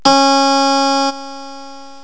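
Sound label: HARPSICHORD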